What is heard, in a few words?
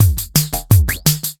Synth Beat, Drum N Bass; Sonic Foundry (Sony Creative Software); Knutrix Drum & Bass